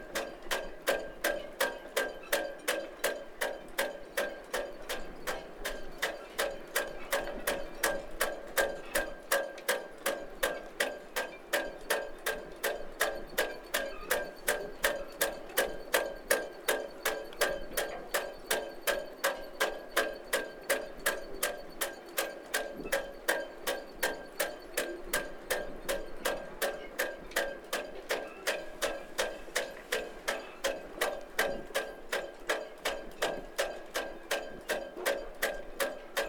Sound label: Single lanyard